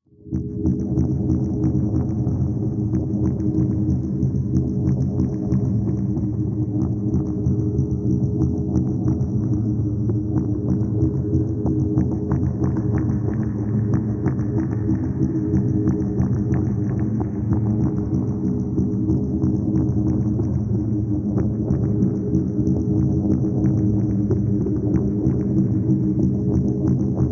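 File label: Low Perception Low Space, pulsing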